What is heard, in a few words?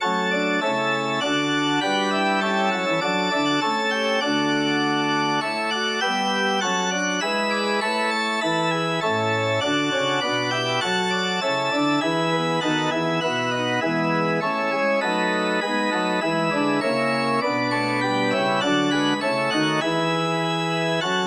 now blessed be thou, organ